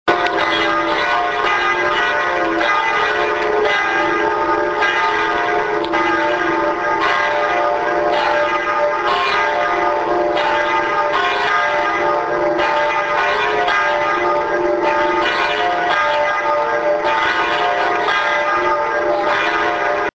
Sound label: bell